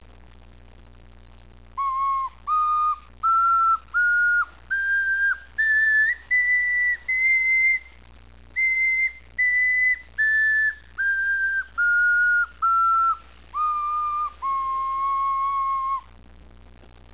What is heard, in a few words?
okarina